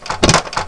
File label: locked door; Castle of Xhentars